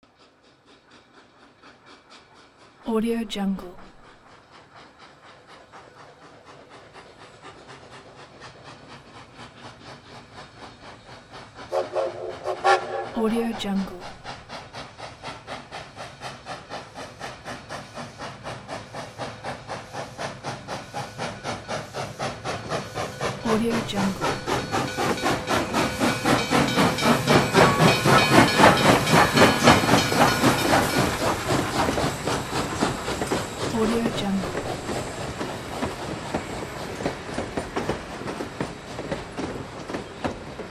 steam train passing near

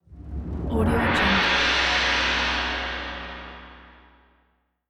gong

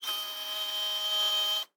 security camera servo; prosoundfx

